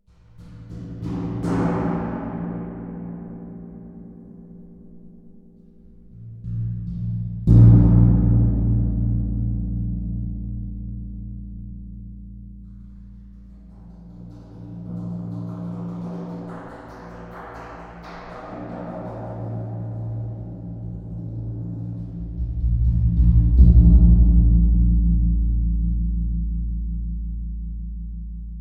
oiltank seydisfjoerdur

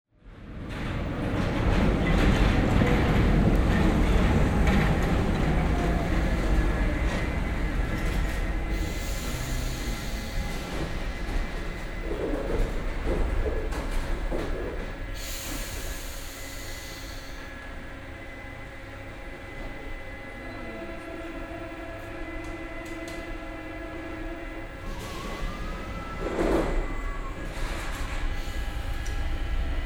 paris subway tones

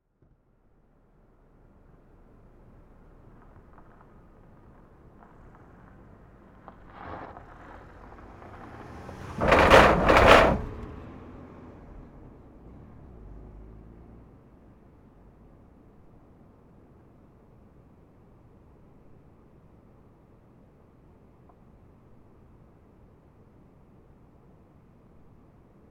road917; Ristarhlid

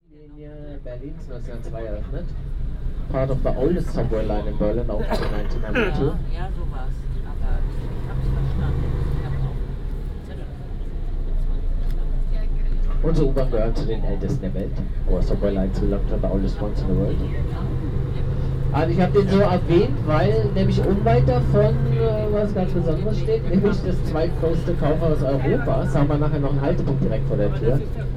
berlin bus tour

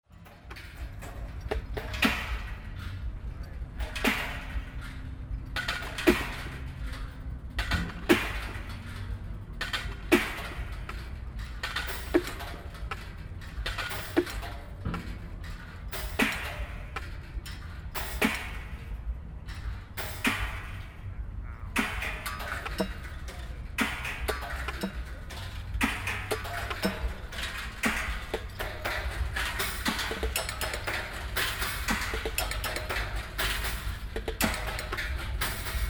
woods; jan cardell

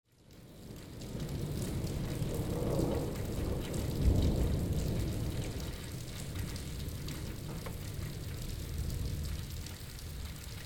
backyard rain

